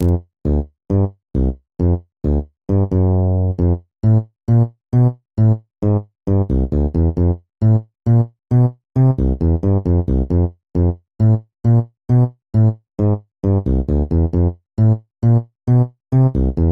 Koto

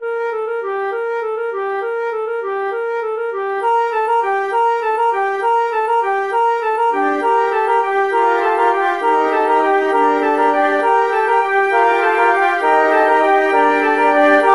Flute Choir; 12 Flute Choir

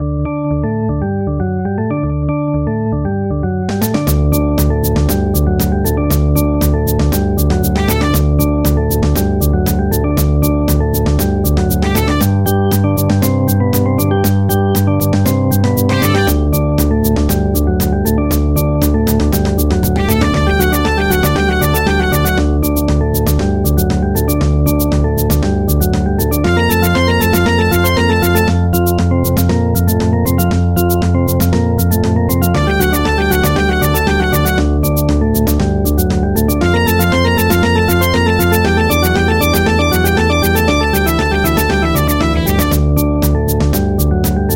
Music

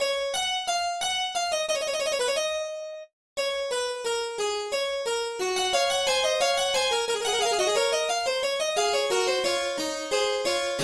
in F Sharp Major; BWV 858

